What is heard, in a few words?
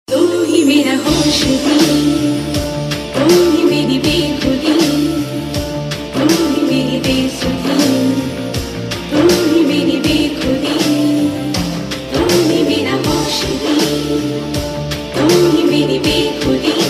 Male And Female Version; Iss Pyaar Ko Kya Naam Doon - Ek Baar Phir; Star Plus